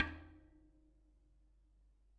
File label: Bicycle Spoke